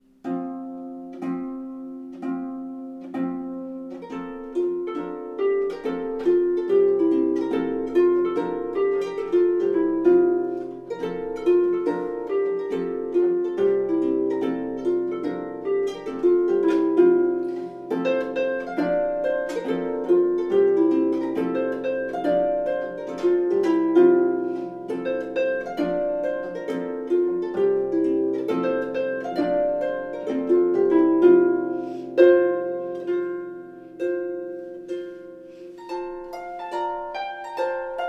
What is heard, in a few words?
keelrow, harpist; The Keel Row; Folk and Traditional